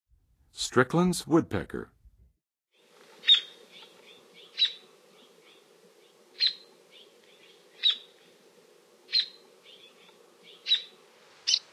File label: Stricklands Woodpecker; North America; Western USA; Western USA Songbirds